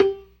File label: PERC, Synth and Organ